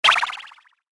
Pickup: Generic Pickup SFX For Collection Of Item, Video Game; Video Game Sound Effects; Video Games (Sound Effects:Games); Stuart Duffield